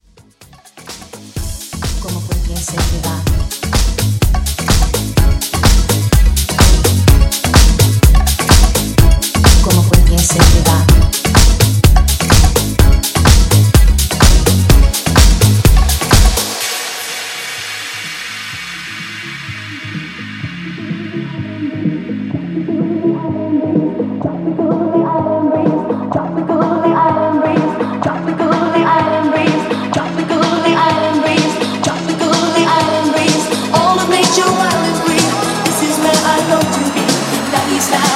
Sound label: Funky House; Remixfreakz